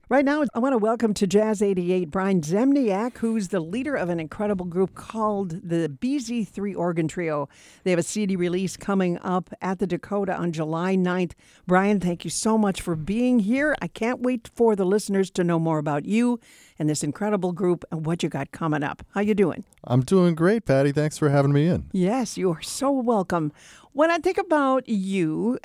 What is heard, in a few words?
Organ Trio; Z3